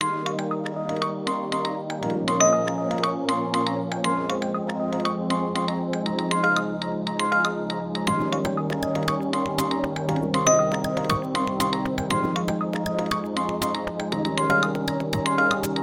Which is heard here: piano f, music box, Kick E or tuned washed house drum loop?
music box